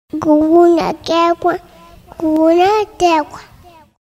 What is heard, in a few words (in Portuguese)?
vinheta